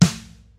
subtle reverb acoustic snare f key, snare drum sample tuned to the F note; snare drum samples